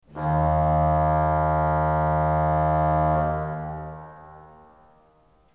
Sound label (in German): ton, orgel